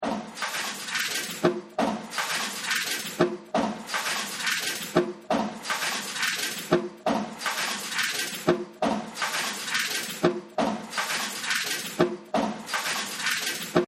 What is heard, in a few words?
Factory; Factory 20